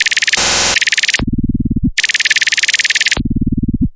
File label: noise, Noise Sweeps